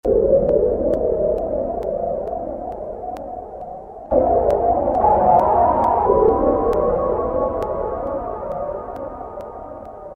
Gong, Roland Juno-106; Factory Preset

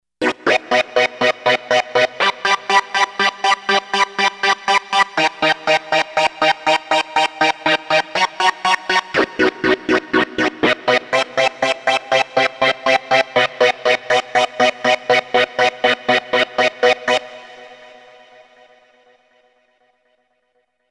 Phase Mountain

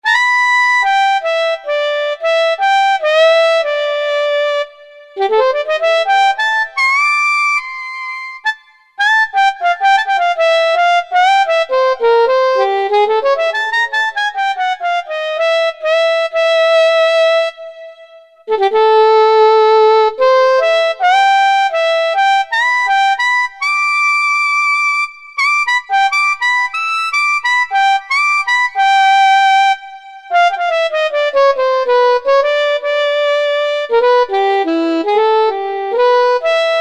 Soprano Sax

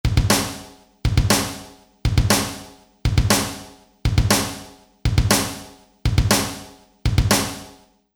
Riff

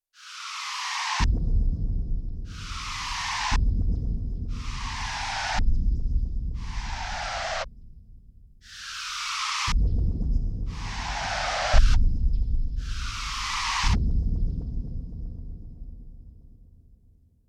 Roland D-70, Factory Performances

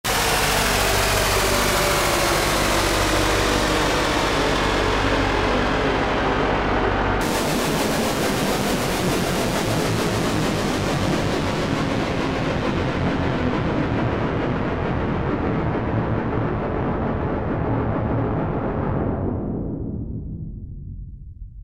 Fx Descender; Factory presets

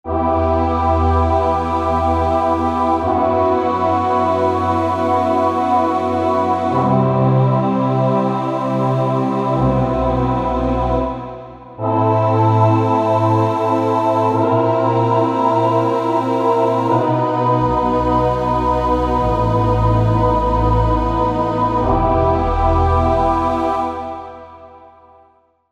The Voices

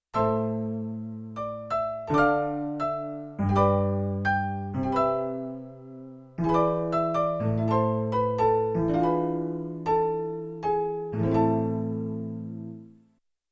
Melancholy Piano; Yamaha TX16W; Jazz Performance; Jazz Performance 2

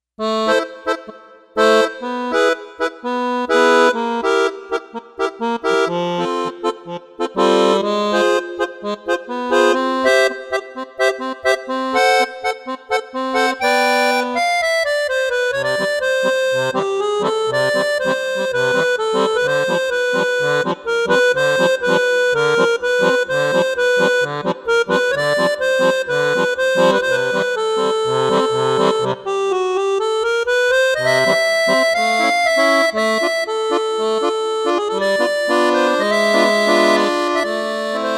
Small Akkord

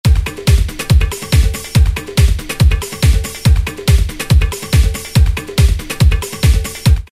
Latin Bt 141, Dance; Roland SR-JV80-06

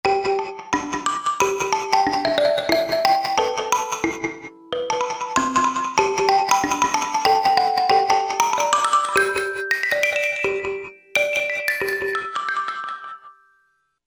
Kalimbone, Korg MSC-11S Brass

